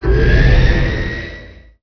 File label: ornithopter motor; Emperor - Battle for Dune